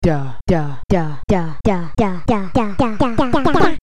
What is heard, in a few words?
tone